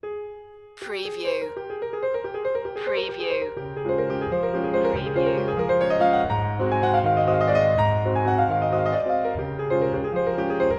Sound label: waltz d flat major; minute; opus 64; classical music for media